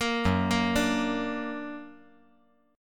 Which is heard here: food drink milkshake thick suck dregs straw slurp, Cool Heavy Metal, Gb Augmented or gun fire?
Gb Augmented